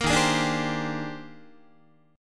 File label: D sharp Major 13th, down, Guitar; strummed; JGuitar